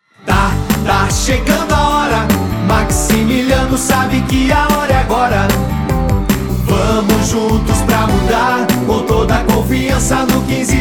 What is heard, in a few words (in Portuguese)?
Jingle PREFEITO; Ta chegando a hora; RENOVACAO